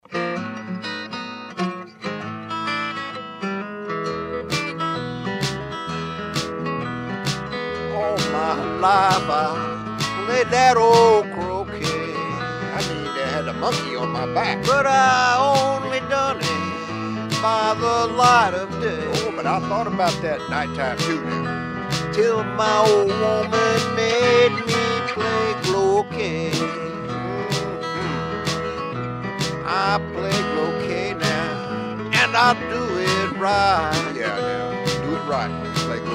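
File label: Blues Jingle, The achy, groovy world of blues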